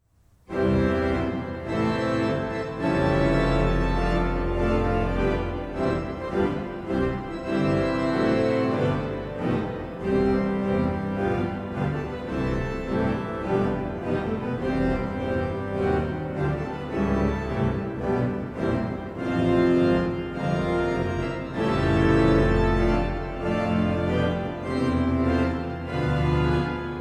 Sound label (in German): Marsch; Liebfrauen